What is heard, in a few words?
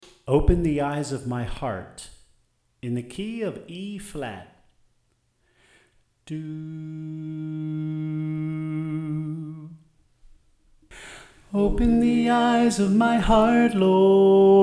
Soprano